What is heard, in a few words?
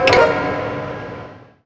A#3, symphony; Northstar - The Wizard VOL-1